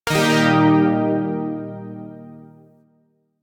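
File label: Shawzin Pentatonic Minor Chord; Chords; Warframe; Zariman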